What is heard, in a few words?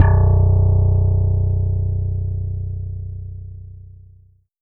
SLAP BASS; BASS SYNTHES; AKAI; Big Beat Samples & More